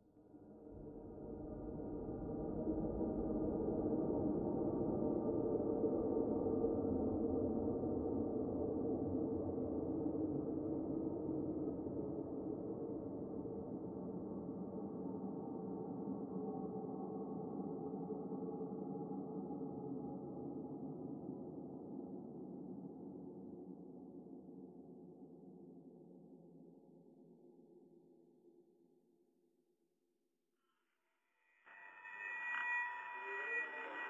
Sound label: Noises